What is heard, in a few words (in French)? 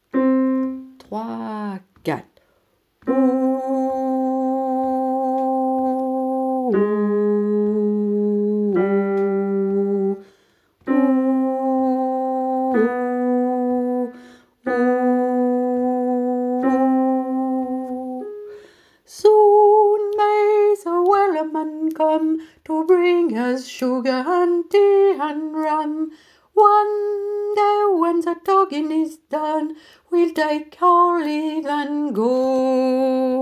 Wellerman couplet refrain Alti